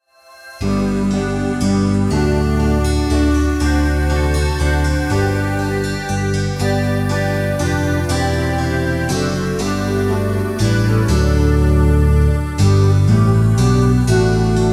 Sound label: ring out wild bells